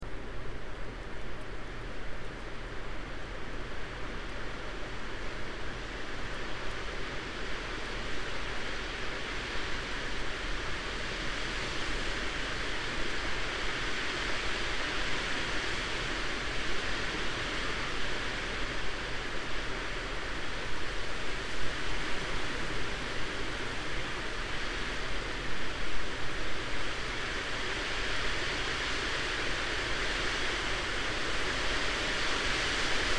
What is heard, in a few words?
wind